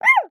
Animal Mammal Herbivore Panda Bear Bark; animals; AdobeCC

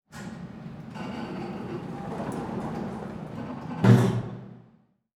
Emergency Elevator Door Close Maximum Security; emergency effects; AdobeCC

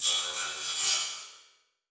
Transition Sweep Medium; Production Element

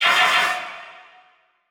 Title Transition Blast Energy; Adobe; Production Element

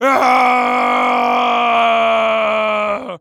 Human Male Yells And Groans

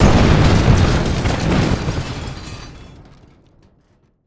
Explosion With Debris; Fire and Explosions

